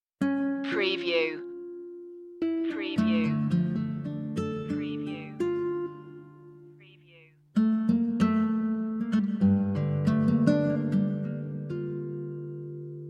ending guitar only

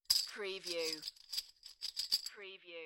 Beer Bottle Tops; beanstalk audio

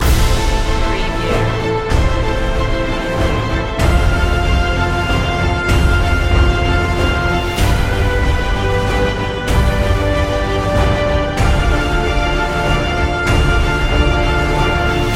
hit hard loop

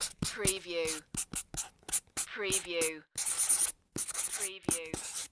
Marker Write On Card

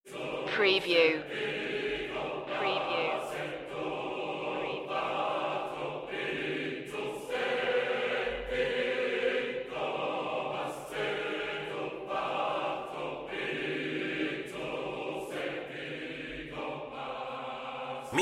choir only

